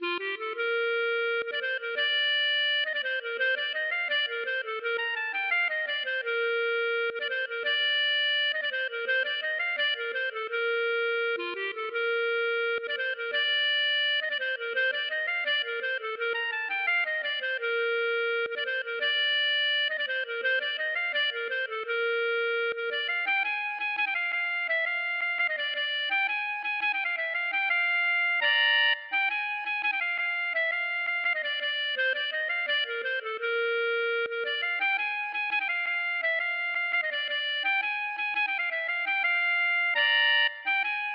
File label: klezmer